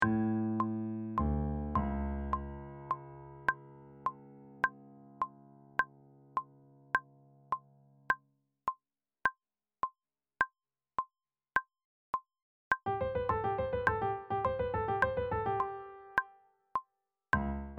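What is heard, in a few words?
cuban at tempo trumpet 2 and bassoon; band resources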